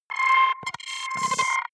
button, signale; soundpool

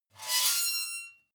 metal squeal bright fast

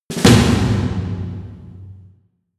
snare roll hit; percussion

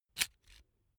electric drill template slide out slow; maschinen; bohrer; soundpool

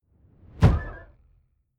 wobble flute dull; whooshes rises

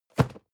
cardboard box medium place hard; objekte

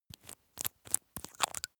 makeup box screw cap open; haus-garten; badezimmer

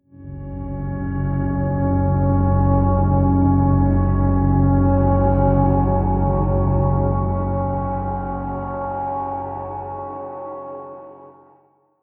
atmospheric drone pad; musikelemente; drones

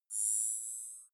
zoom in long

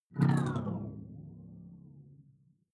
sci-fi alien big bionics down; swooshes-swishes

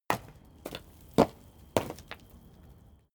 stone medium fall faint echo; natur-tiere; steine

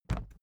briefcase plastic put down

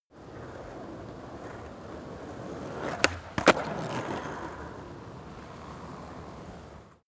skateboard single drive by jump small

